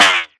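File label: impact bullet generic titan shield; Titanfall 2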